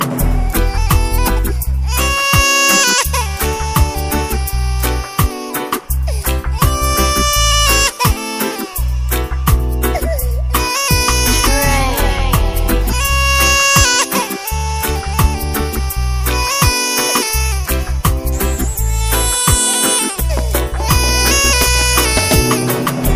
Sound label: riddim, reggae